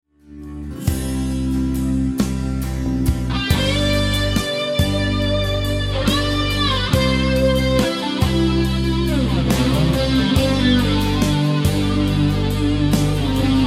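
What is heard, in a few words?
solo EHX RH Big Muff, guitar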